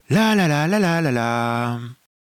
Lalala

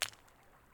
Rocks

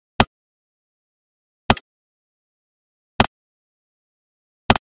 Impact Bullet Hit Body

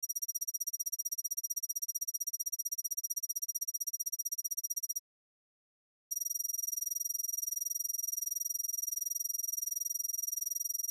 Screen Printing Tremolo Tonal High

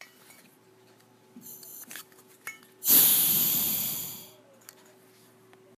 Air Escaping

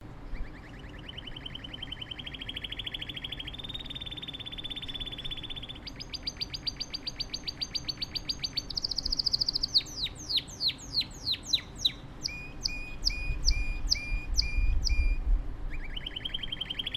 Canario Canary

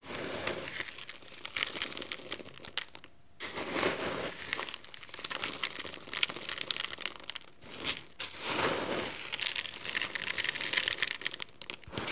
Grain In Bucket Taking

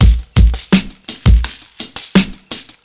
Kalimba

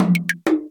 Tom tom Rising

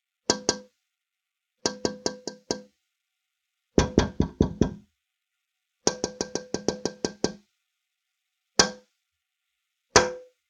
Window Taps And Knocks

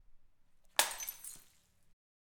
Shattering Glass On Floor

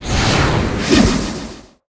Wind

